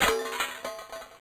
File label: tile hit